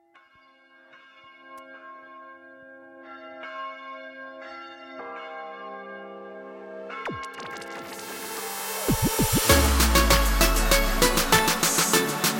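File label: in Vietnamese language; jingle bells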